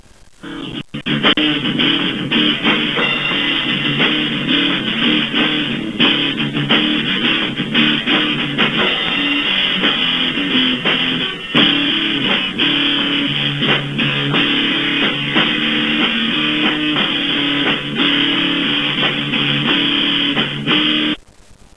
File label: guitar